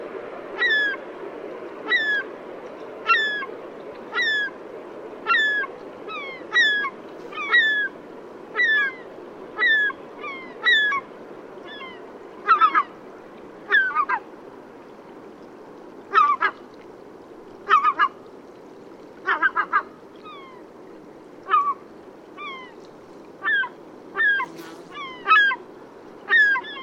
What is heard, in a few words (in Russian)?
burgomistr larus hyperboreus, zvuki chaek